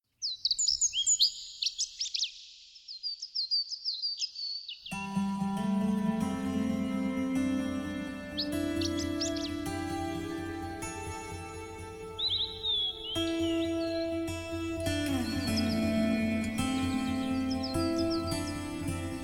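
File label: guitar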